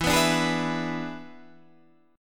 Boj E7

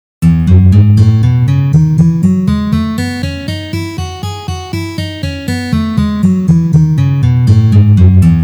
Gamma Mi mazhor 1 pozitsiya

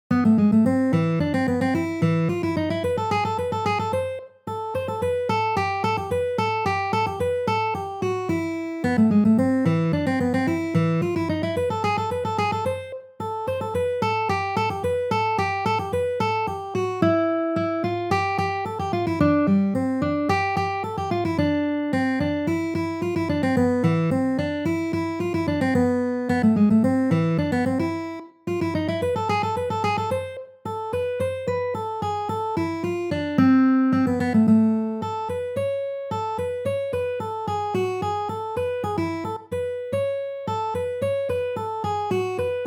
taby dlya gitary; Turetsikj-marsh

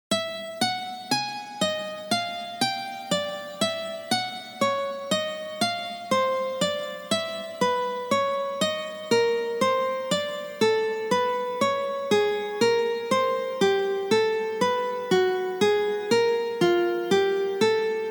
Uprazhneniya dlya rastyazhki paltsev

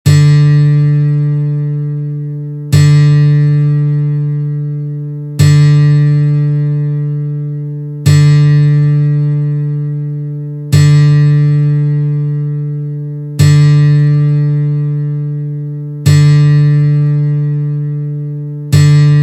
struna Zvuki strun akusticheskoj gitary

